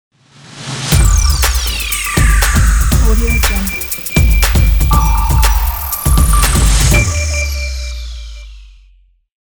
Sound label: catchy opener ident; infinity-sfx-productions